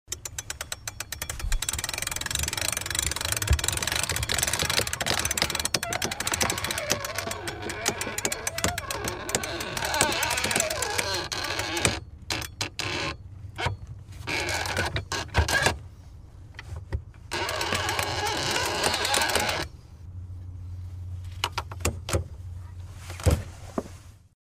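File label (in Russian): механизм поворота парусов на яхте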